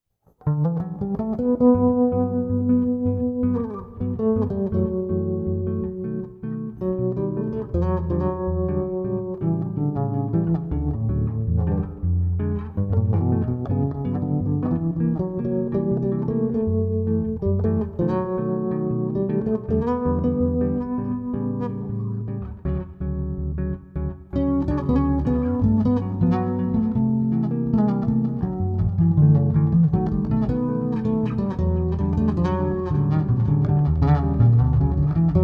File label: bossa nova